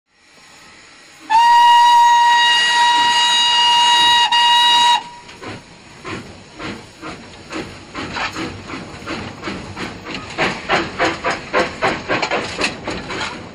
Train Start-Steady (Exterior) Steam Train Whistles; Hollywood Edge 3-D SFx